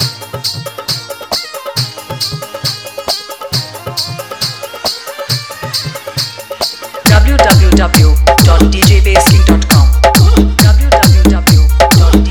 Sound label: Hard Vibration Mixx